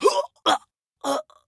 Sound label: scav death, voice; Escape from Tarkov; scav1